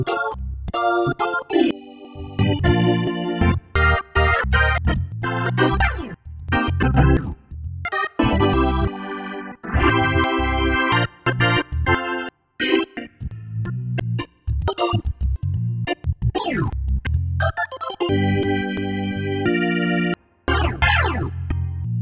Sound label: KEYS; NAMM 2018; MyMix Recordings NAMM 2018